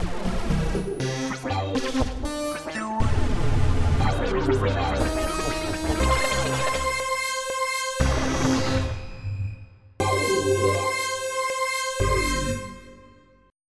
Double Synth FX

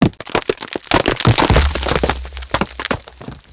rocks

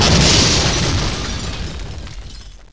crumbl; duke nukem total meltdown

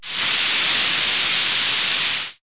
valve; KABOOM! - Wild and Wacky Sound Software; industry; air

